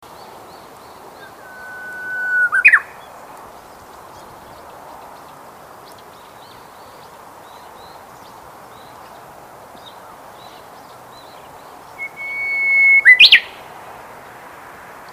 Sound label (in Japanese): uguisu